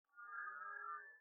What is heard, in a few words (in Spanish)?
vaca; st-gallen; gais; choir of species